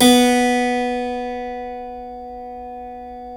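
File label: B2 clavinet left, Keyboards Acoustic; USB Soundscan vol.17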